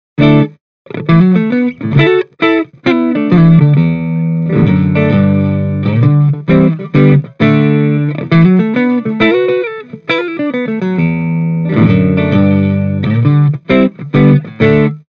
Clean, Stratocaster; Mini Rox Mic Inside; Seventy 80

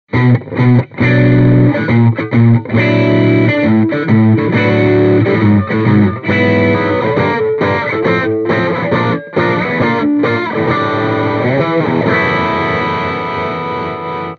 Celestion G12T 75 Crunch Les Paul

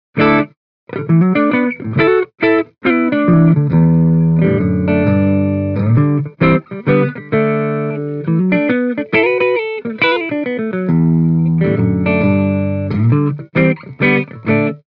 Celestion Greenback G12M Clean Les Paul

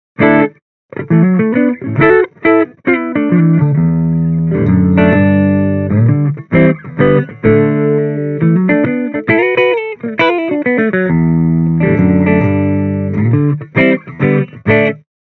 Mini Rox Mic Inside CANNABIS REX Clean Les Paul

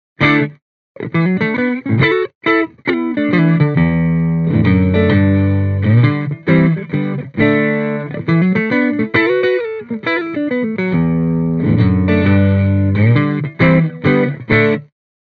Celestion G12T 75 Clean Stratocaster

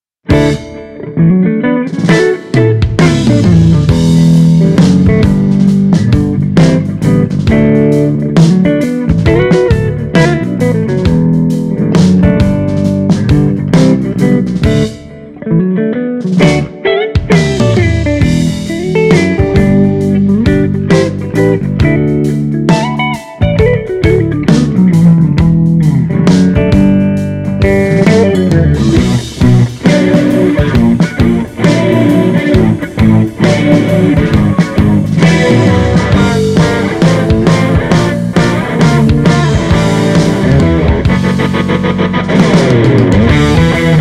Mini Rox Mic Inside Jensen C12N Les Paul

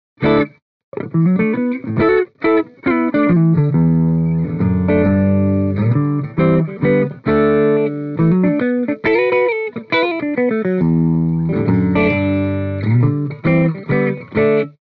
Celestion Vintage 30 Clean Les Paul

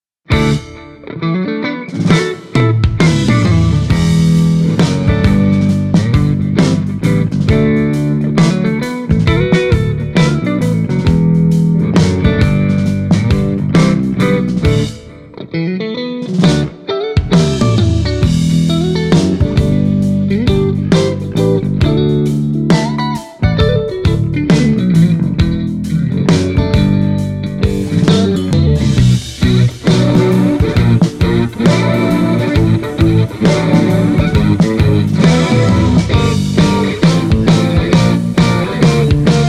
Celestion Vintage 30 Stratocaster

